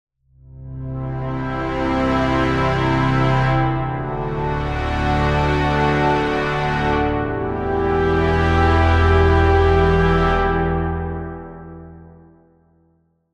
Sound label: Pad; Routed Sound; Sylenth1 Expansion - Flame Summoner Vol.1